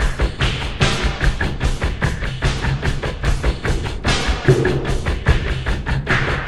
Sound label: Lake beat